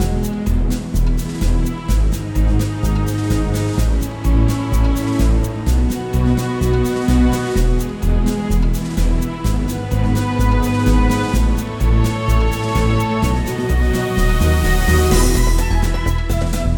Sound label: music conqueror; Castle Storm